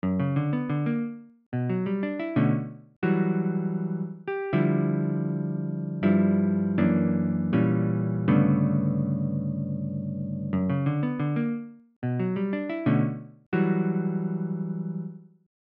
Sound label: WALTZ; 15 SECOND WALTZ